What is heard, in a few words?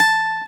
C GTR HARD A4, Celtic Flavours; USB Soundscan vol.42; AKAI